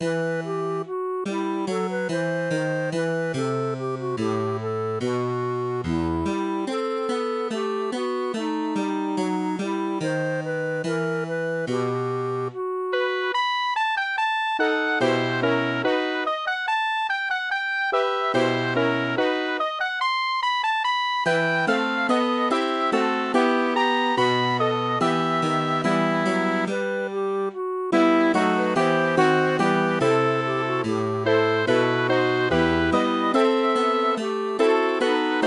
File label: MIDI